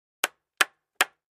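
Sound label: 2x4 Hammer Nail On Cement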